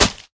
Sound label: mud land; Golden Crayon Sounds